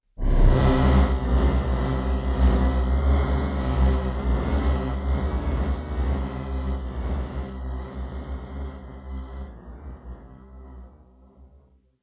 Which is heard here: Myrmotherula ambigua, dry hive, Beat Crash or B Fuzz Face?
dry hive